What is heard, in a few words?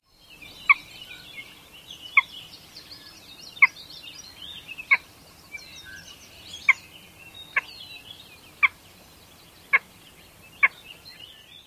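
tick alarm calls, Red-necked Grebe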